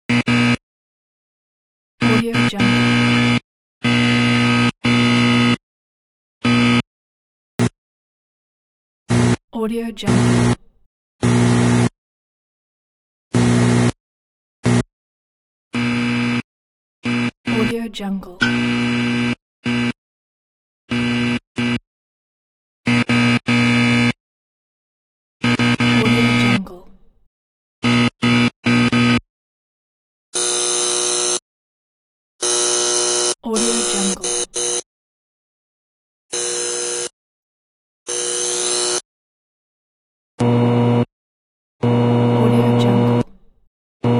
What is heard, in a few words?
neon lamps light strobe; pure-noise